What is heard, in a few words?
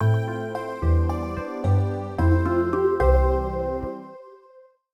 Jingle Chapter Unlocked; Fluidity